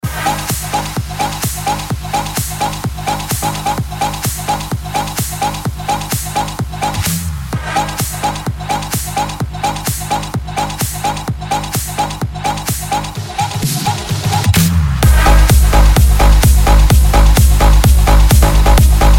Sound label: Real Bass